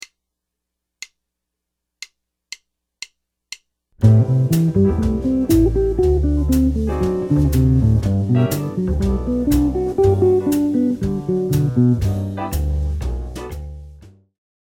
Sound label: arpeggios, guitar